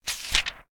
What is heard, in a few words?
buffer change; Golden Crayon Sounds